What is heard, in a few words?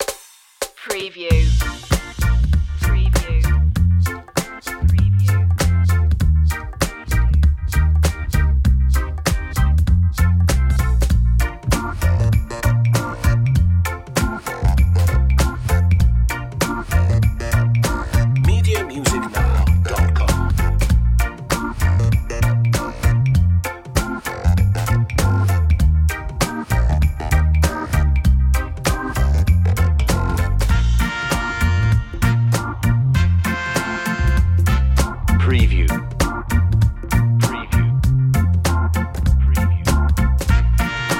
reggae party